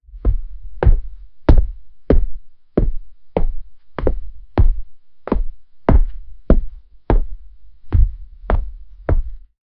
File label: cyclical slow footstep o